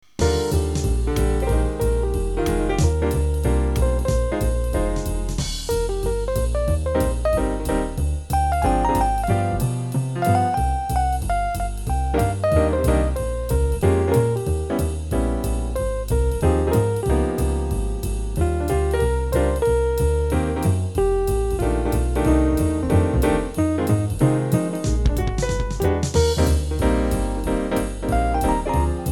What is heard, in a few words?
Jazz